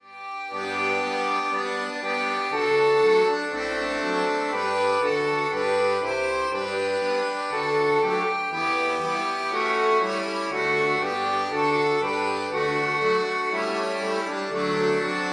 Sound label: Adeste fideles playback